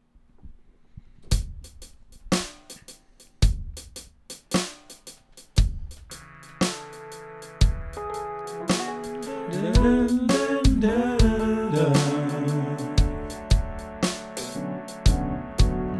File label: band practice